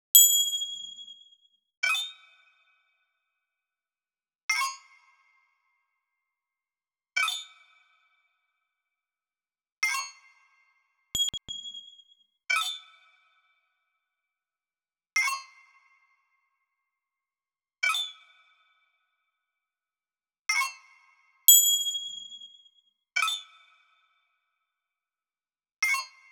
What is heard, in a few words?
percussion